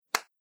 Single Hand Clap; Human Body Sound Effect; Andrew Fleming

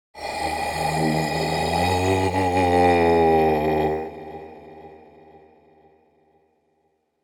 Male haunting ghostly groan; Ghosts; Horror

